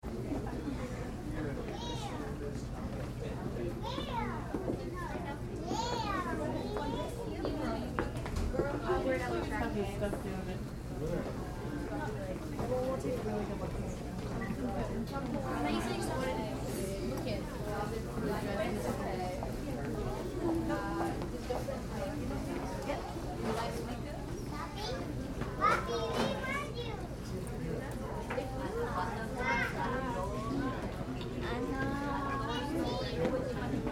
Crowd Hospital Vendors Hall Busy; Sickkids; Hospitals; Big Room Sound

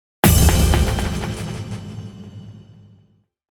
Hit Drums Musical Swell Panning Delay; Musical Percussion Hit Cymbal Reverb Production Element Imaging Element Accent Transition

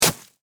Snow Crunch Icy, Grass; Weather; Snow (Sound Effects:Weather); BlastWave

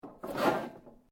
metal rods move

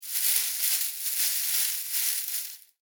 Foley Grass Movement Foliage; Environmental; Nature

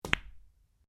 Billiards Cue Ball, Home billiards table; Pool (Sound Effects:Sports)

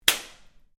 Whip Crack Belt Strap; Foley sounds for melee weapons; Whips (Sound Effects:Objects)

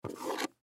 Chap Stick Pick Up Table; Pick Ups; Objects; Blastwave; Foley

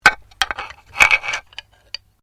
Bones Human Move

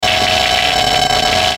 Electronic Transmission Static Warble; Production Element Imaging Element Accent Transition

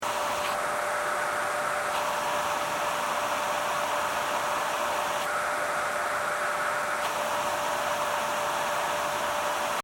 Vacuum Cleaner Unknown Operating Loop; Household Appliances

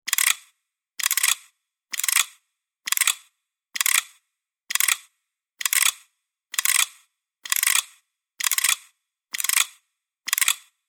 Clockwork key winding noise; Winding (Sound Effects:Objects)

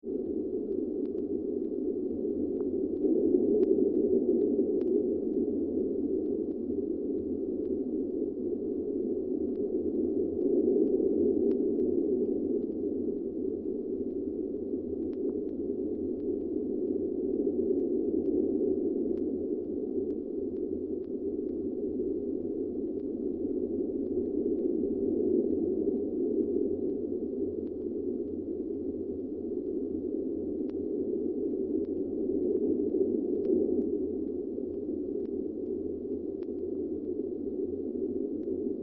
Alien Planet Ambience, Noon; Otherworldly Ambience; Sound Design

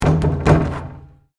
Basketball Rim, Sports, Bounce; Basketball, Net, Swish

